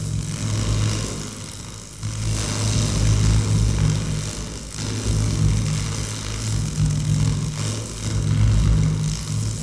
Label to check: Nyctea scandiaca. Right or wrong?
Wrong